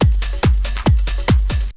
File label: Bite Size Beats